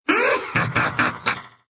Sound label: Nyx laugh, ru